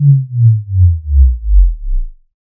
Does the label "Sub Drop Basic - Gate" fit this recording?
Yes